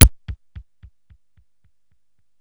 505 Drums, Roland TR-505

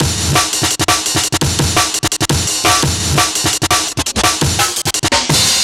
170 Break, Loops; m8 breaks

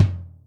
2 Head Hi, Yamaha DTXpress Acoustic Kick

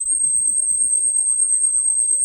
DS8 C 6, Korg; Back In Time Records; Korg Universe VOL-1

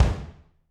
BD, Drums; Bob Clearmountain Drums I

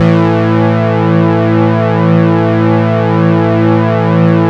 ROLAND JX3P, A2; Big Fish Audio - First Call Vintage Keyboards

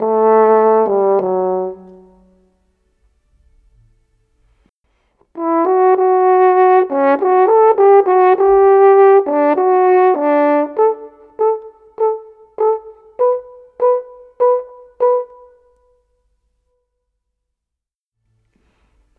Horn, Orchestral, Rock Pop

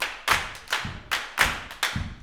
CLAP FEET; Spectrasonics; Vocal Planet; Blues Soul

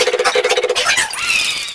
Fan Chant; Mario Paint

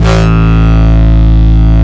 TURBO; NorthStar - The Wizard VOL-2; TURBOTRONIX

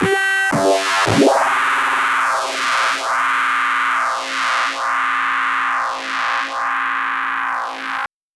gtr, guitar

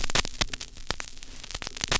NEEDLE NOIZ, DJ TOOLS; Drums In Your FACE!

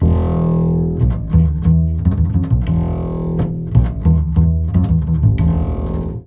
FNK, Jazzistic

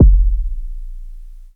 DRM BD; Fourteen; Polyend; Plughugger

